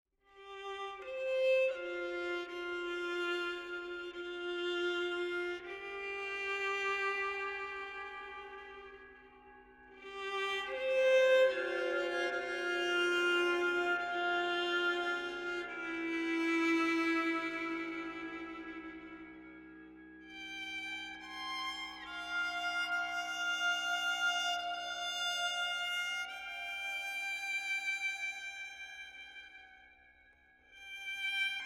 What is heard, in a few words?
Violin Weird Theme; Get Even; Ge Asylum 02